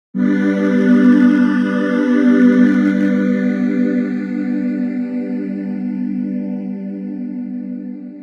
Techno Ambiphaser, jup8

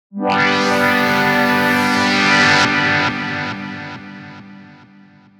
Dream Wait Pad; jup8

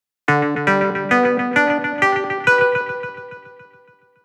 Dream Distant Pluck, jup8

